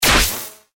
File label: electrical fuse burst